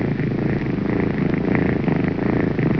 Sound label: purr